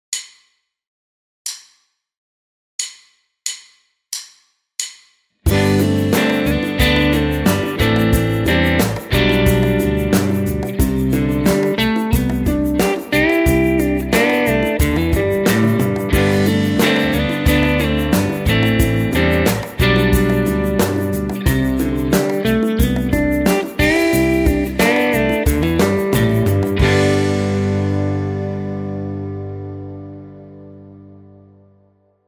rhythm and lead, open g tuning, guitar

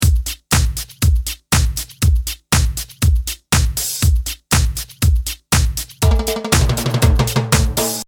Timbales fill electronic kit

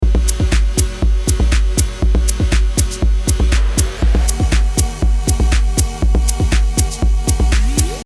Resampled sound with new beat; 10 track starters

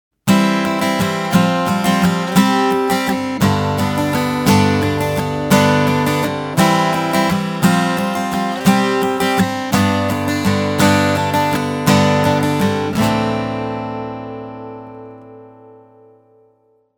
CGCGCD chords example, acoustic chords; 30 acoustic chords

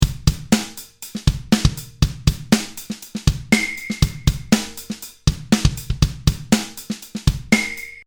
Claves FX acoustic kit

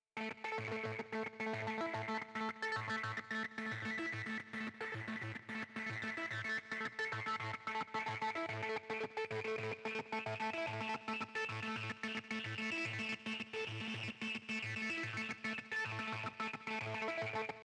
Delay on arpeggio

